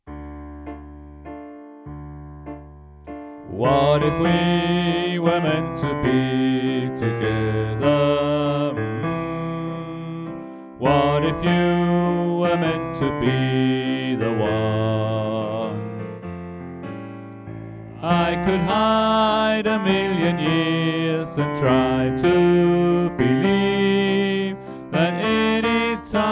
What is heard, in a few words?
Tenor; oevefiler